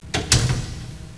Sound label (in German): Fenster Zu